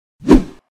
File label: woosh